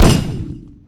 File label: sonic jackhammer